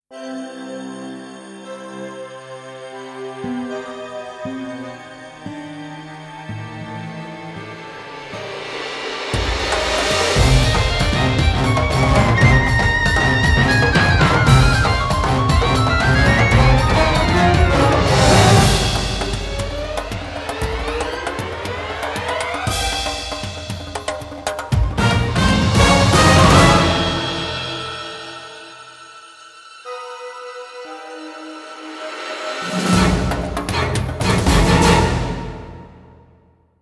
Alluring Lullaby; Monster Hunter Portable 2nd G

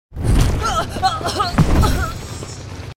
Slave Attack Burn; Goddess of Trampling

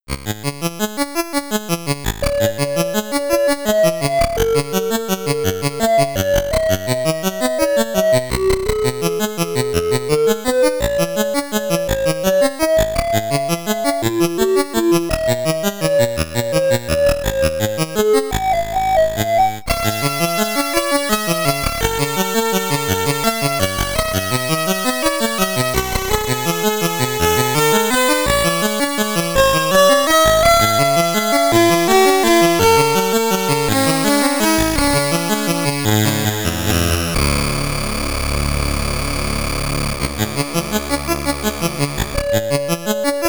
Main Theme, SID Stereo; Flight Path 737; Commodore 64

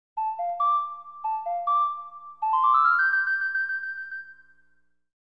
Ocarina ''''; The Legend of Zelda Ocarina of Time 3D; 3DS

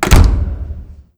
door bang close; lucius

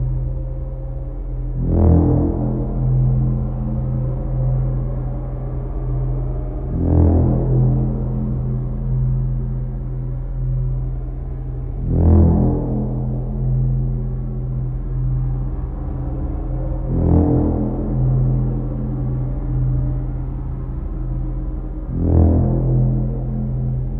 Hazard Sinister Tone; No Man's Sky

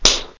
Slap; talking tom cat android ios mobile gamerip 2010

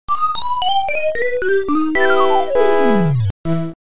Zapped

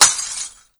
glass; super trench attack

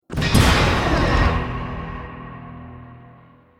Stinger Action Short

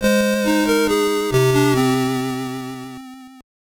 Death; lion king the game gear

